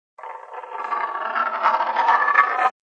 Marble Roll on Wood, Marble rolls on wooden surface; Rolling; Objects